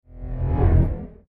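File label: Science Fiction Dark Laser Movement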